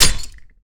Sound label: he bounce, grenade; weapons; Counter Strike Model Pack; CS2; hegrenade